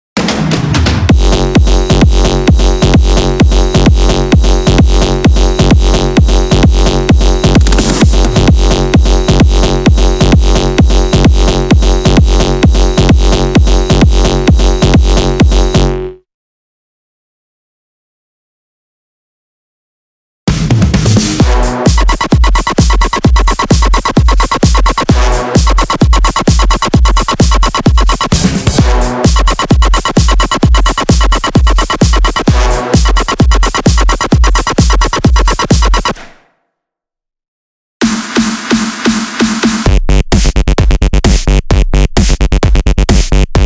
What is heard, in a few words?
intro music roughly made bass house; black box